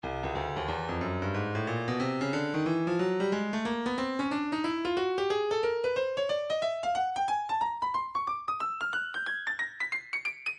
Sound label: Percussion Tambourines tam shake, midi; Stylus